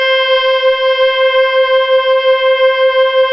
CELLOS C5

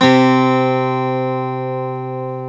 GTR 12, Ac 12+ E.12; Club-50 - Foundations Roland; Dual Guitar

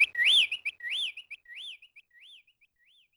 Tweet, YAMAHA RM1X

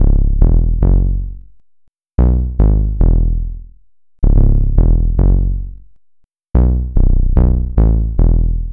Bass Line; Eclectic Electronica

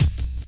BD; Best Service; Dance Mega Drums

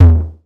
808 TOMS; Electronic; Wizoo - Platinum 24 Electronic

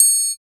TRIANGLE; East West - Phat & Phunky

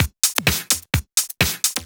Clubhouse Loop 128 BPM

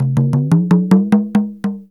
INDIAN DR G#6, INDIAN PERC; Spectrasonics - Heart of Asia CD2

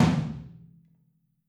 Drums Percussion

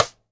Ludwig Supraphonic Snare Cross Stick; 1965 Ludwig Supraphonic Snare One-Shots; Sonic Foundry (Sony Creative Software) - Vital Drums

